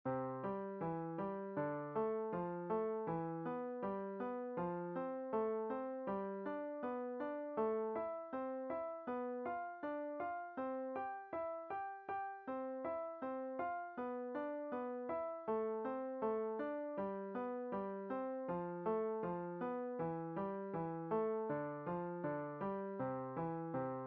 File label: Five finger skip, piano exercises